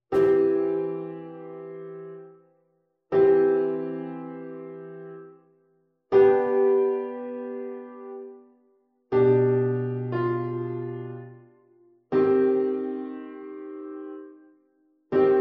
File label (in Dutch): vierstemmige zetting; notatie van verdubbeling in de prime, in andere stemmen